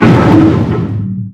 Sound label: Ice Crash; Barotraumatic